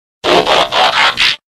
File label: transforming sound; transformers